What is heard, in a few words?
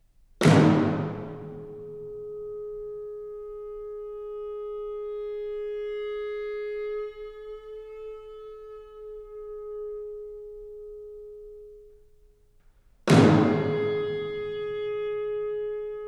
Concerto for Clarinet And Orchestra; John Speight; 1980; Melodious Birds Sing Madrigals